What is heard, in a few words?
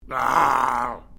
Created Creature Small Ouch, Alien Sound Effects; Created creatures, vocalisations and pretend speech